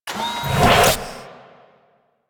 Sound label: Anim Found Shell Open; Tenno; Animal Foundry; Warframe; Liset; Hub